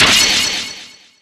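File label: Se Battle Boost; Etrian Odyssey I HD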